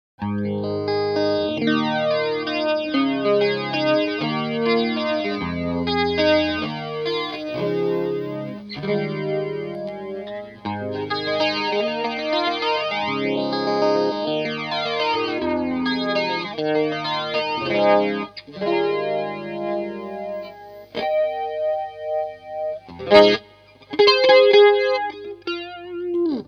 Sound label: flanger